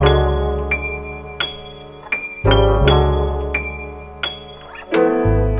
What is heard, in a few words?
piano, Loops Sad